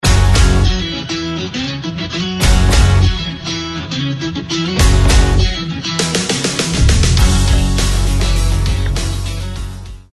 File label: Ringtone